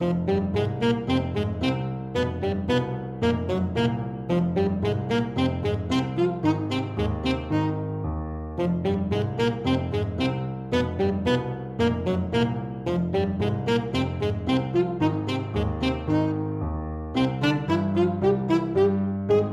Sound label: saxophone; grieg; mountain king